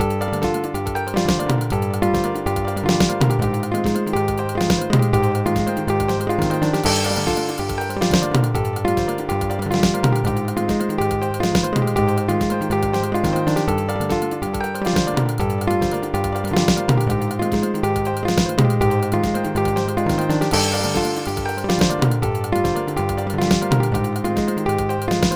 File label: solo; Dr. T's MIDI Recording Studio